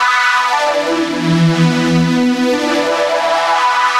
Progressive Synth, Trance

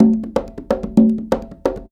Percussion Loops, Bongo & Conga; Houseworx

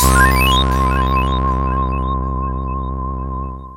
COSMOSYNTH, ENSONIQ TS-10; Rubber Chicken Software Sample Bank

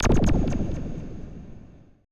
AMBI FX

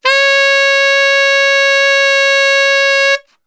C#3 SAX STR, Brass; Best Service; Brass Super Section

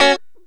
Lounge Guitar Chick Major F#2; Zero-G Kocktail Kollection

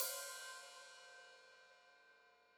Hat Open, Hi-Hat; Deeply Sampled Drums

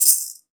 Kids Shaker, One Shot Drums; Drum & Bass

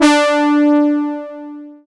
SYN, analog

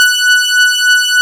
LEAD, Nord; Wizoo - Powered Nord; LEAD PAD HRN

